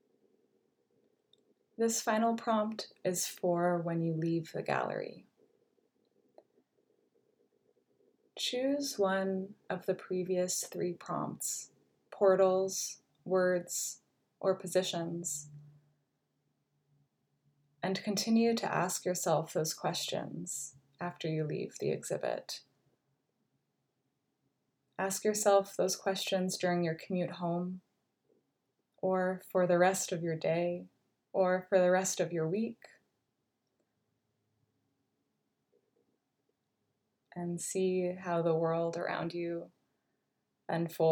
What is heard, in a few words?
sound walk; Soundings: An Exhibition in Five Parts; UBC Creative Writing